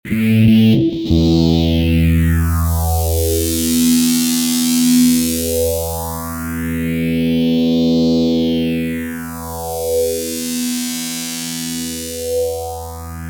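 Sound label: fuzz, Nady phaser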